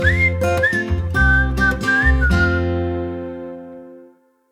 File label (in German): LOGO Pfiff